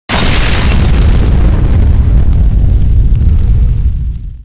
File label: huge explosion